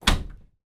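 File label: furniture cupboard large old fashioned door close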